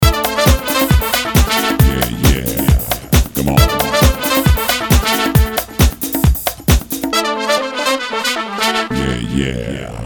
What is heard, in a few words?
Retro soul